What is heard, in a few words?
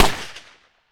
RIFLE; Roland - LA Composer vol. 1